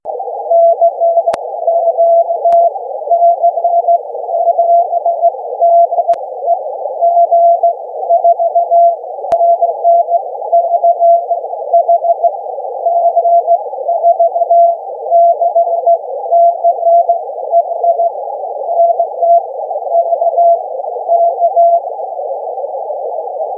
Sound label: CW 400Hz